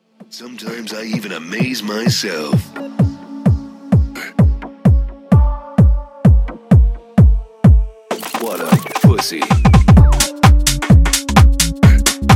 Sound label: SWING